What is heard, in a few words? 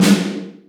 short reverb snare drum sample g key, Royality free snare drum sound tuned to the G note